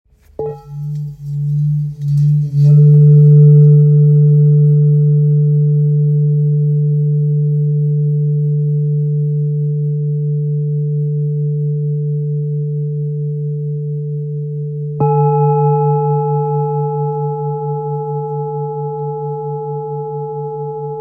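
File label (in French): Bol grave lotus 1.39kg ré, Bol chantant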